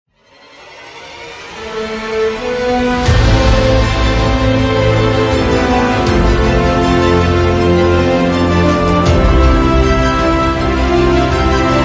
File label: An Epic short